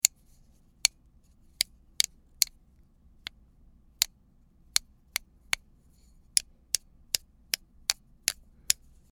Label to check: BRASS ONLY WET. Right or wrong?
Wrong